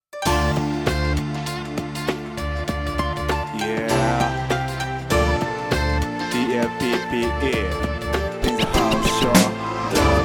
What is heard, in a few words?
HIPHOP